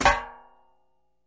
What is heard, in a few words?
Metal Hit Ring Soft, small impacts